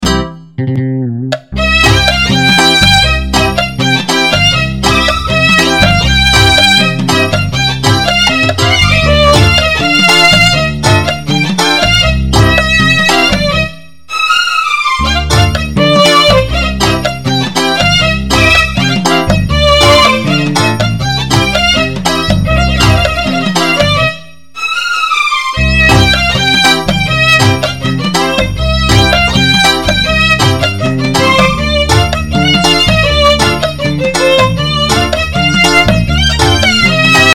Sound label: nokia arabic ringtone